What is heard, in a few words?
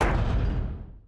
agricultural tower grappler orient stop; Factorio